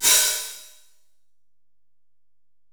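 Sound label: 909 open hi-hat; Wizoo - Platinum 24 Electronic; 480L SP 909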